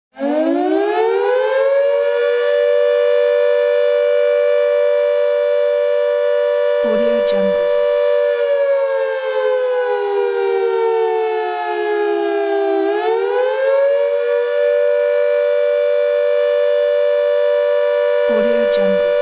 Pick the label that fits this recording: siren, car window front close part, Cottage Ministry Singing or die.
siren